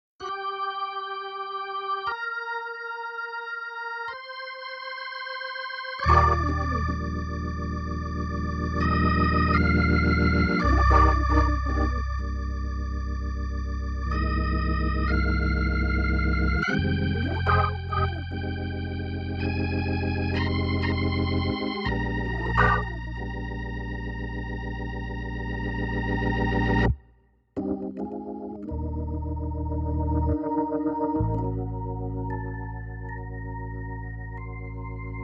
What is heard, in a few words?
nord c1